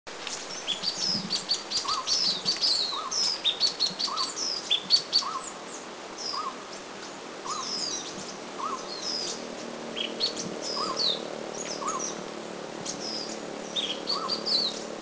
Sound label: Chestnut-tailed Minla, Thailand; Doi Inthanon